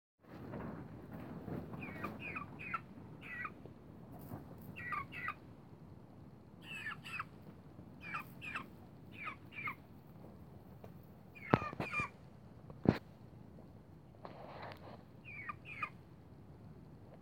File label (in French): poussin perdu